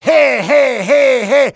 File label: conga; Taunt demo